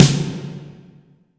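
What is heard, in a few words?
SNARE, drum machines; JV-1080 DRMS; EdgeSounds; Drum Mashines VOL-1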